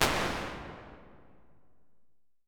Large & Dark, impulse responses, large spaces, rooms; Apple